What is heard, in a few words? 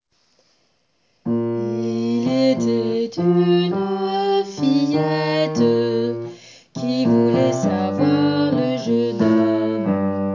Fillette basse